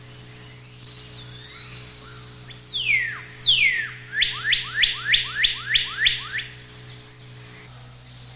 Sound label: cardinal